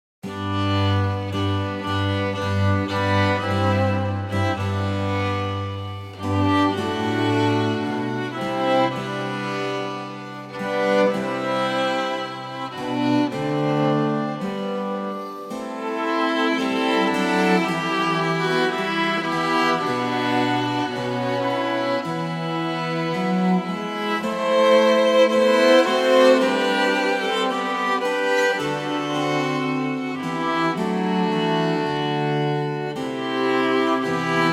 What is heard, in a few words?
STRINGS; Schöpfung; Lied